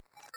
Teleport Activate Rev; viewfinder

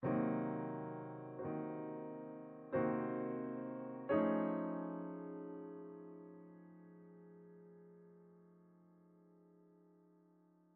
Museum Boss Piano; music

